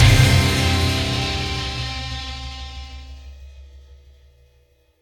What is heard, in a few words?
Boss Super Swing Stab; DeadBird